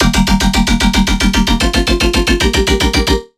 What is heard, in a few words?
Melodic Bass G 150, One-Shots Bass Hits; Spike; Ghosthack; Color Bass Essentials